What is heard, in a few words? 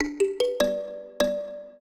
trio, mbira; Mozart